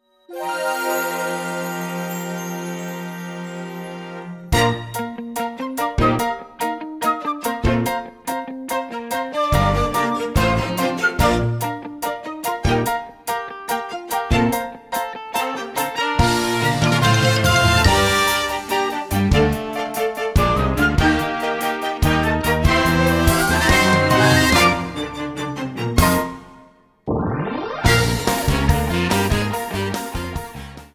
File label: Accompaniment; Cat, Thing 1, 2